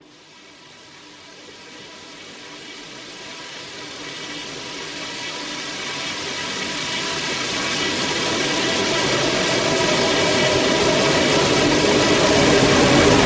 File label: Tense Swarm